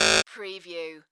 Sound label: Beep Negative; sound-effects-bible